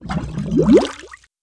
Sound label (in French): bulle pure